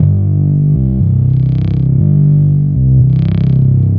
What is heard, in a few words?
Rafter Bass, Bass Foundations; Sonic Foundry (Sony Creative Software); The Electronic Music Manuscript Collection